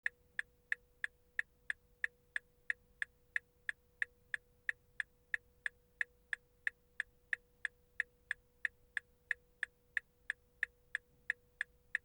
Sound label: turn signal; transportation